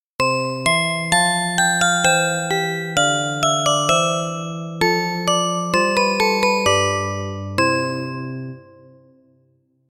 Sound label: Jingle